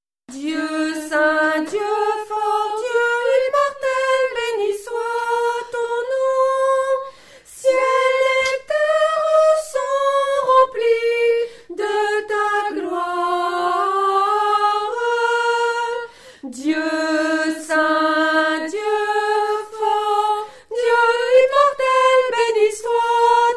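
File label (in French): Sanctus de Lourdes Soprano, chœur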